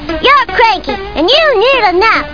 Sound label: cranky; Chat WAV